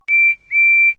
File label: whistle; Percy